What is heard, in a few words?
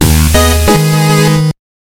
Music Boss Intro; Shovel Knight King of Cards